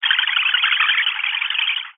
science fiction radio receiver transmission interference burst scrambled white